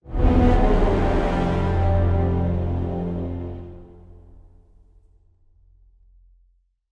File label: Stingers Onboarding Stinger Negative 148BPM